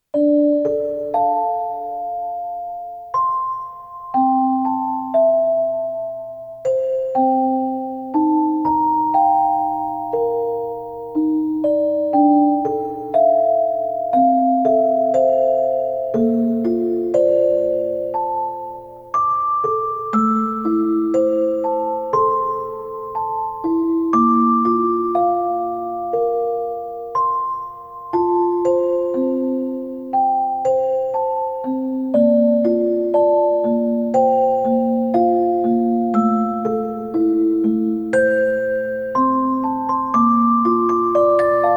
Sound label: Time is a Place (Celesta Vers.); Finding Paradise Soundtrack